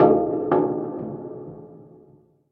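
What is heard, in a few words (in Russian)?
пианино эффект эхо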